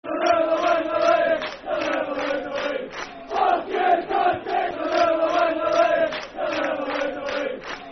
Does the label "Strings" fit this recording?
No